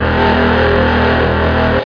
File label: POWER UP; JOHNNY